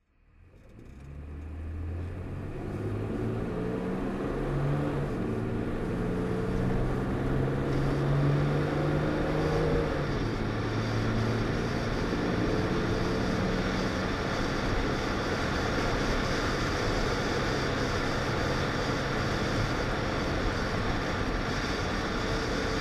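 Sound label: jaguar S type 3.8 automatic driving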